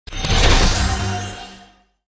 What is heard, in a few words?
skill star